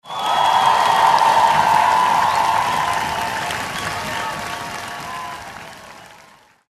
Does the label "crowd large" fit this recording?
Yes